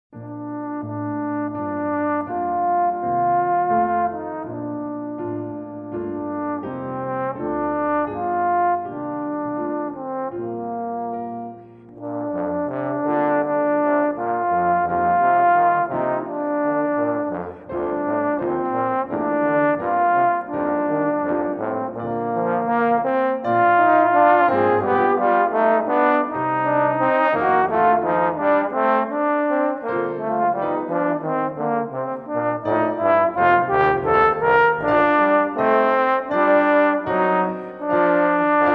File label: Angels We Have Heard